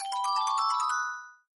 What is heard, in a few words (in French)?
niveau sup